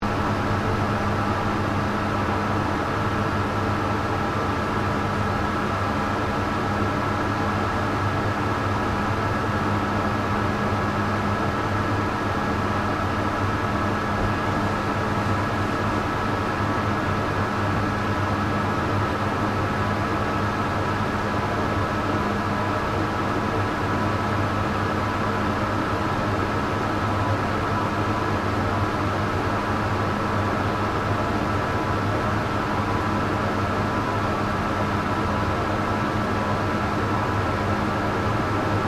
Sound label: SERVER ARRAY SMALL OPERATING, Computer server banks; Electronics